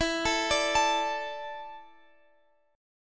E 7th Suspended 4th E down, guitar; arpeggiated

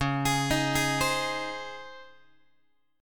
C sharp Major 7th Suspended 2nd, Guitar; C#M7sus2 arpeggiated; JGuitar

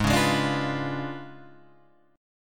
Db 7th Flat 9th; Db7b9/Ab strummed

